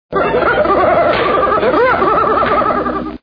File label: laugh